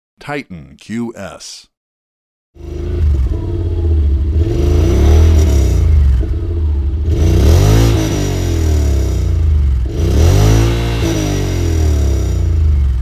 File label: yamaha wolverine x2 titan sound